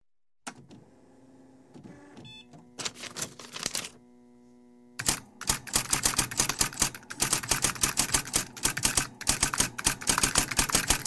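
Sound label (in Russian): электронная пишущая машинка включение заправка бумаги печать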